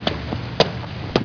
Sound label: footstep